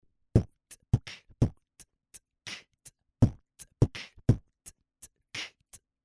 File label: beatbox loop poor recording